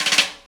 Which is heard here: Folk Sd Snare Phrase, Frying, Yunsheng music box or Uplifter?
Folk Sd Snare Phrase